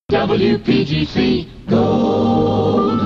jingles goooooold

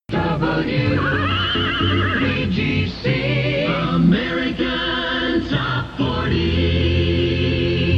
jingles; pams; series 42; with at40

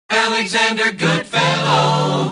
jock jingles; pams series 42a

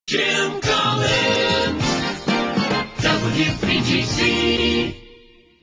jingles logoset; priority one

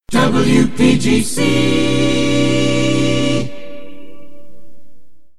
jingles image pack remix long voice track

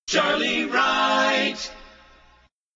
jingles logoset accapella; priority one

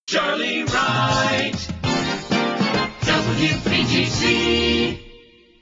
jingles logoset; priority one

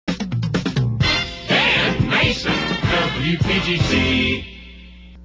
jingles; positron

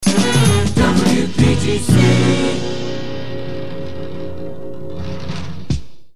jingles image pack remix full logo fast

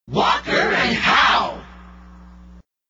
jingles shout; walker and howe

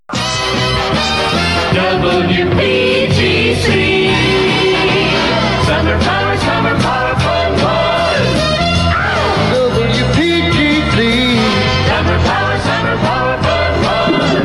jingles summer power fun fun

